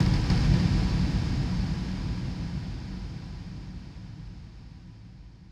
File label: Impact